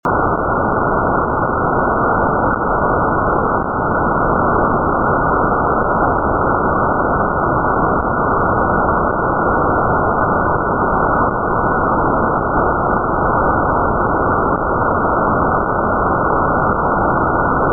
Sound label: Static